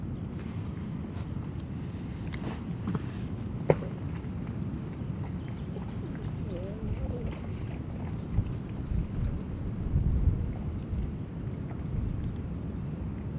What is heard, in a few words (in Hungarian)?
repulanyul halklepesek szelesido, szurkefarkas; hortobagyi nemzeti park 2013